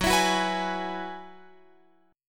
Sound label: F sharp 7th Suspended 2nd Suspended 4th F sharp down Guitar Open F; F#7sus2sus4 strummed